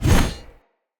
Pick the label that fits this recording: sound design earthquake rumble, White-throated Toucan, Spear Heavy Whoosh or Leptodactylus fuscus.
Spear Heavy Whoosh